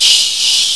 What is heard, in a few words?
air tank; valve